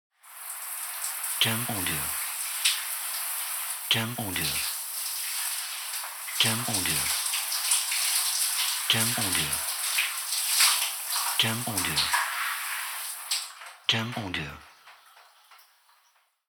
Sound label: Showering Short